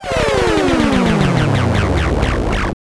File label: landing